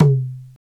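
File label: perc darabuka; Roland LCDP14 Africa VOL-2; Af. Hand Drm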